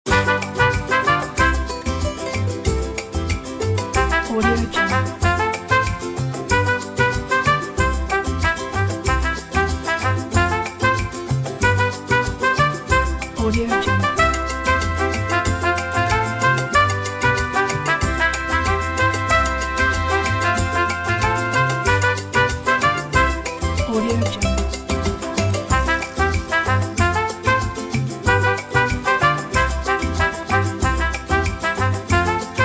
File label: mexican fiesta fun